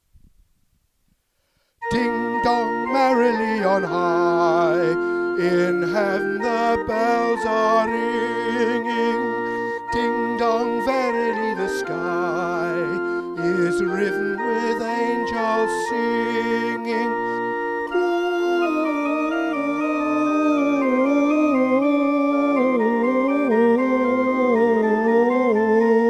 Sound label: Soprano; Ding Dong Merrily